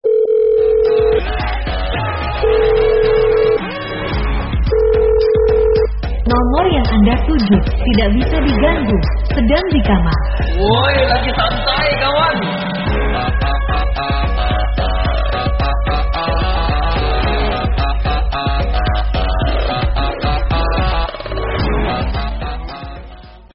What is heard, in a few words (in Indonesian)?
nada dering versi remix